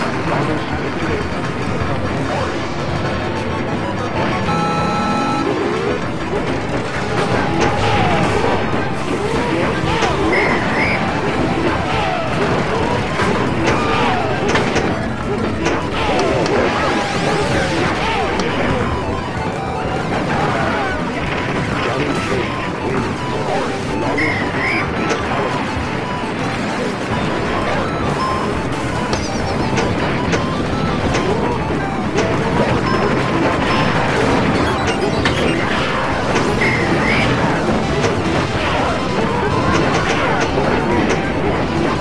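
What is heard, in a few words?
ambience arcade; Arcade Ambience 1992; Andy Hofle; midnight guns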